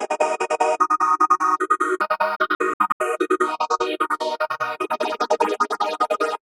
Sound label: techno chords; wavefield